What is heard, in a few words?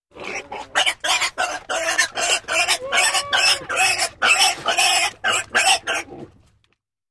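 Piglet squealing rapidly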